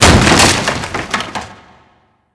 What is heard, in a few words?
wood debris